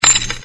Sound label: key fall